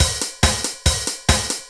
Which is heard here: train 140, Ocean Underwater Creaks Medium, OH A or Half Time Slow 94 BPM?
train 140